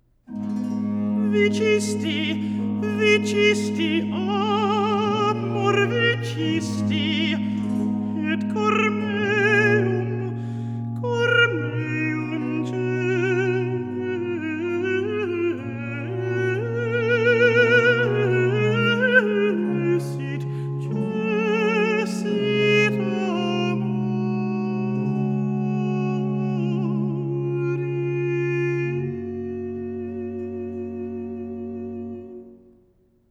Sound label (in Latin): Recitativo, Motet; Infirmata, vulnerata; Vicisti, amor; Scarlatti; Cantates